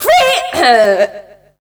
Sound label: FEMALE, Dance Vocals; Best Service ProSamples vol.12; AKAI